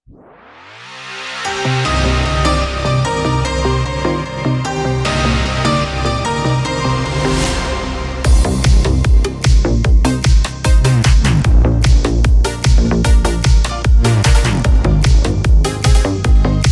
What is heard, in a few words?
instrumental